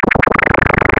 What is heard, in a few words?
creature